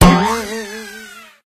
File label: bumper car hit into air; tf